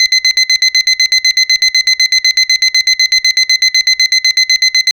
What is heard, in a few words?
alarm clock bleeping, annoying